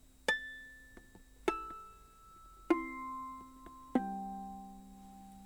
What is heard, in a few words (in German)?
flageolett, ukulele; koaloha